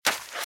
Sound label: Footsteps - Sand - Dry - Run - Left; RadwinIsland